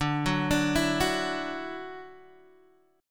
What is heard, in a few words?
D-Major Add 9th D down Guitar Open D